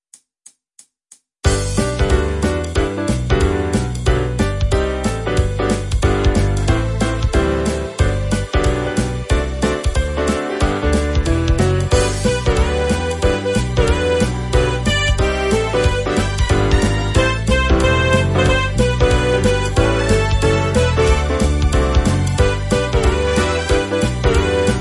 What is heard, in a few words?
jingle bells big band sound